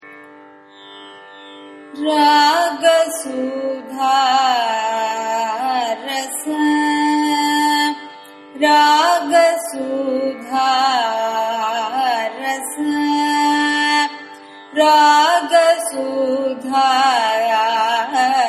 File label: raga andolika cine